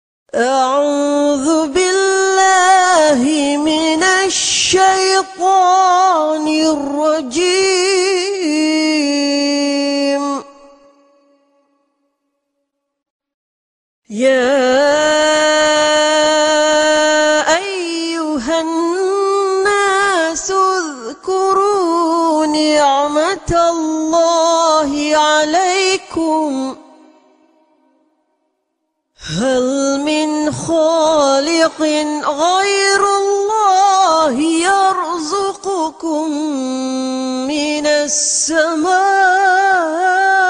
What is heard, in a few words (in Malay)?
Surah Al Fathir (ayat 3); Quran